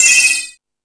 House Machine, Drum Machines

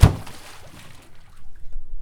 water slap

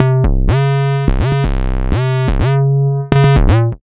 Slo Bass; Sonic Foundry (Sony Creative Software); Underground UK House & Electro

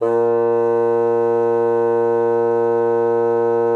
bassoon, A#2; Xsample VOL-6

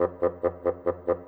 Bassoon, Orchestral; Sonic Foundry (Sony Creative Software); Orchestral VOL-2; Modern 12

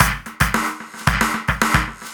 CB LOOP; Zero-G; Chemical Beats

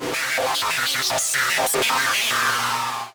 FX, SYNTH FX; AKAI S-Series CD-ROM Sound Library VOL-6

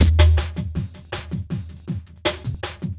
Beat, Futurist Drum & Bass; Drums & Percussion

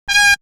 Wind; AMG; Brass n Wind

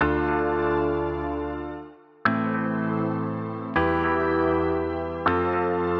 Taped 80BPM A min, pianos; 99Sounds; Monosounds; Music Loops; Instrument Loops

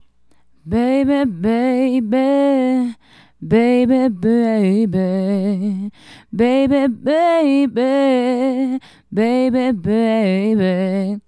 Female Solo, Hip-Hop and RnB Vocals

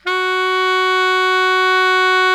BARI MF F#3, saxophones; Greytsounds - Philip Wolfe David Crozier's Saxophones; BARITONE

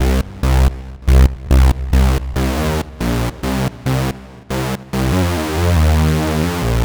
Up Down Bass; Dr. Fink's Funk Factory

